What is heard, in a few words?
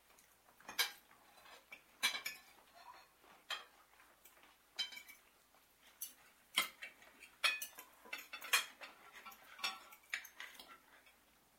Dinner eat two people; The 39 Steps